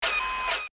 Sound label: CLEAN, industrial; zones